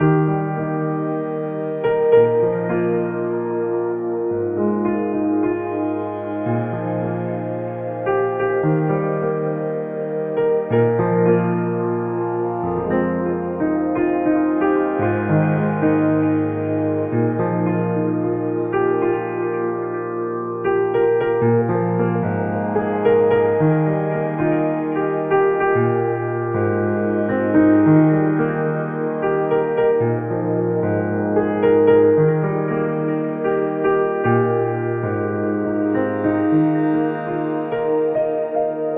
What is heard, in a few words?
piano; jingles politicos